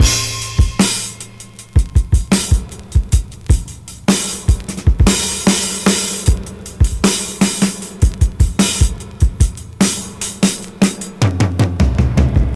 77 bpm breakbeat b key, Free breakbeat - kick tuned to the B note. Loudest frequency: 3173Hz; drum loops